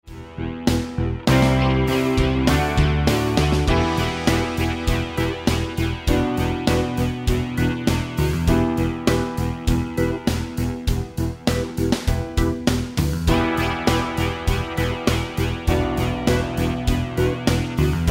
80s Rock Jam Improvise With E Natural Minor Scale

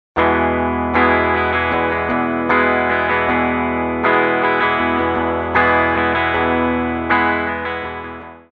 e strumming, guitar

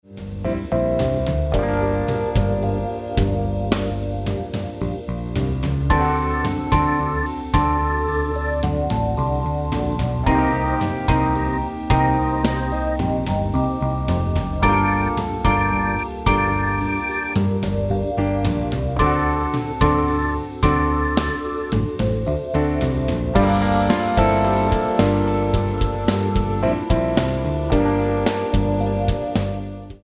Cool Blues G Blues Scale

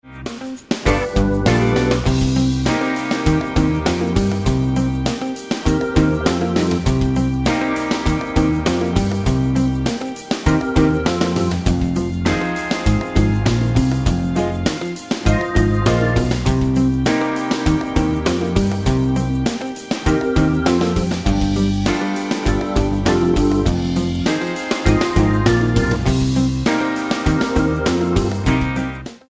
Soul Blues 12 Bar Blues Backing Track in Cm

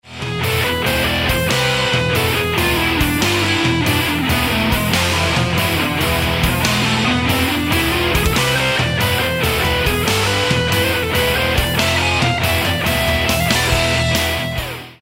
metal guitar scales phrygian dominant

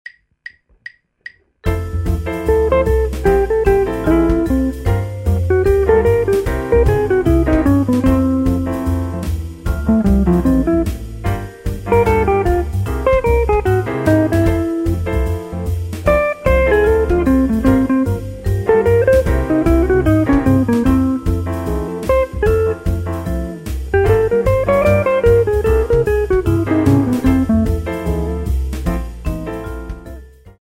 Improvising With Altered Scales Example

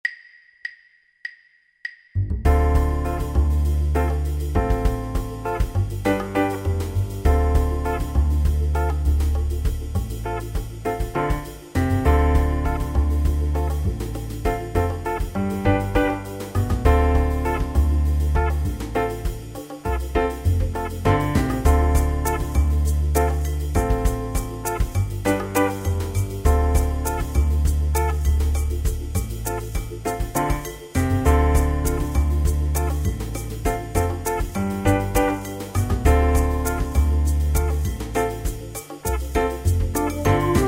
Improvisation With Dorian Scales Backing Track

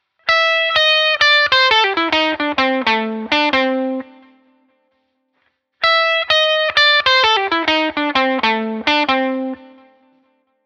Blues Lick

